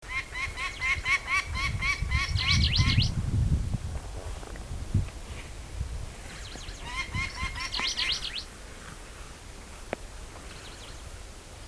Peregrine Falcon Falco peregrinus japonensis

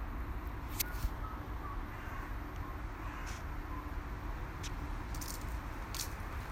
Bird call

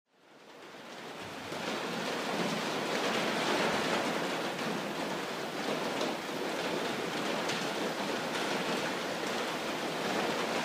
Rain

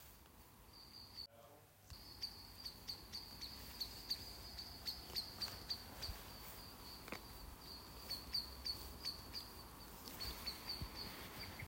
blackbird

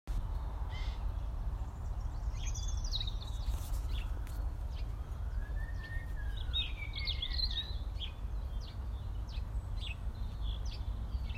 British bird, Kent

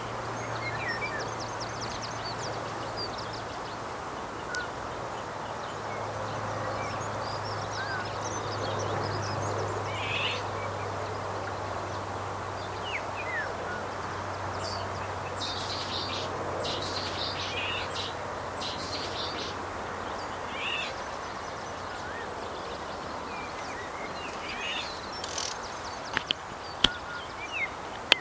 oriole

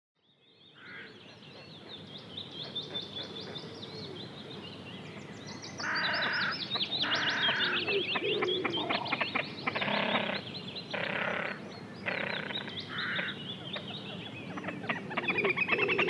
Great Crested Grebe

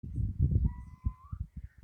bird call

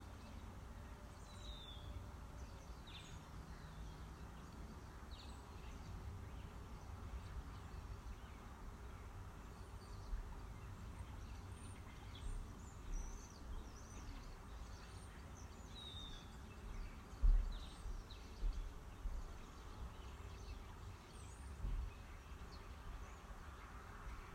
Starlings

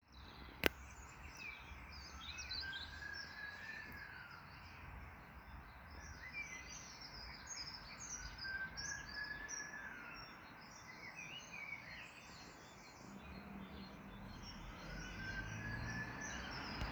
Lockdown bird

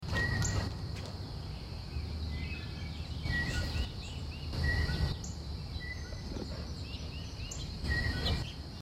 Mystery bird

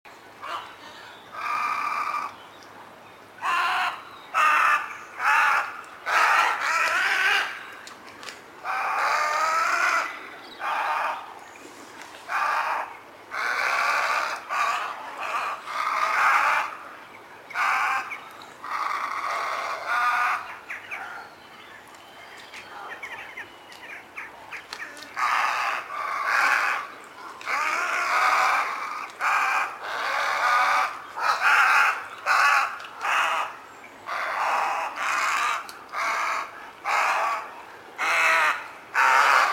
Little Egret Roost

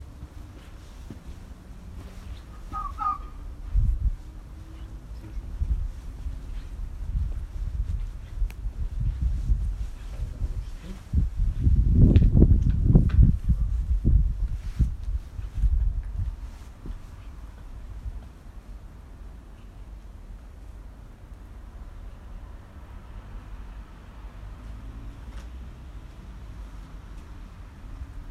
Unidentified bird, bird call; We know we have tawny owls but this doesn’t sound like them as far as I can work out.; I think it may be the call of a fox rather than a bird

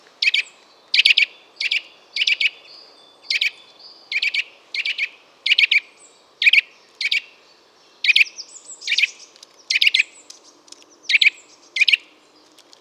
Eurasian Nuthatch Sitta europaea

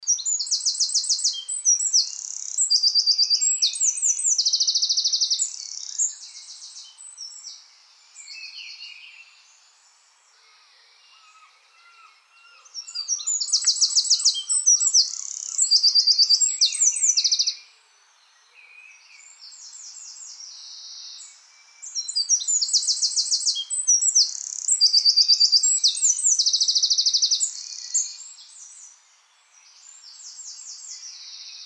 Eurasian Wren Troglodytes troglodytes indigenus

